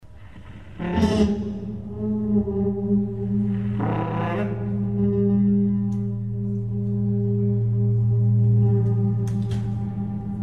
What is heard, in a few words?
harp and bassoon